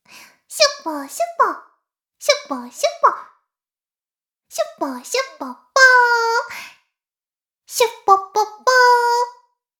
妹 しこしこ音声; おにいちゃんアイスぅー！

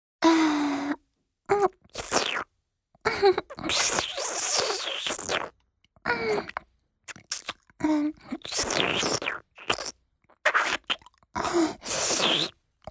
パイズリフェラ(弱)：陰茎を胸で挟みながら亀頭を舐める; 実用ボイス抜粋集

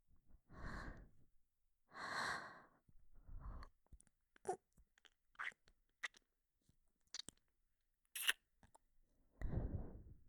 ちゅっ…ちゅっ…, ASMR

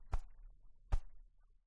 ぽんぽん音

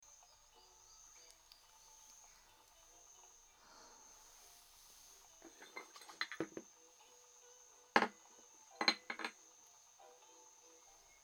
珈琲の音; すずしろ雨音喫茶; Japanese

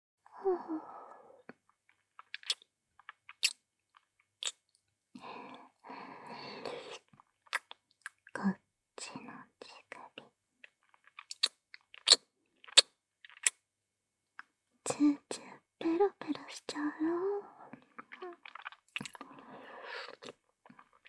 左乳首舐め, ASMR

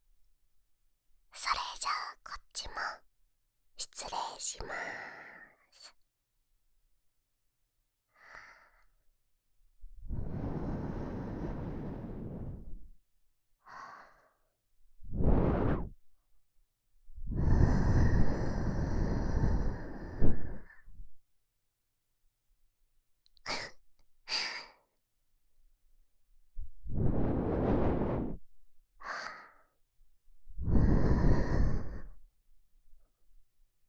お耳ふーふー 左, ASMR

